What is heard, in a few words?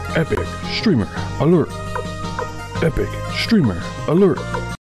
rpg epic alert